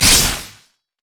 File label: electrical surge attack; LoL; kennen